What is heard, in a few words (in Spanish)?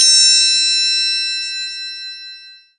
triangulo; primartis; sonidos